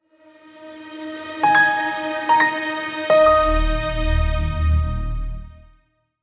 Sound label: piano tones